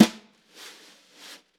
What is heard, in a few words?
Vintage Snare Drum; Q Up Arts; Johnny.C's Vintage Snare Drum; 6.5X14